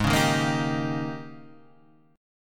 G sharp Major 7th Suspended 2nd Suspended 4th, Guitar, Standard; strummed; chord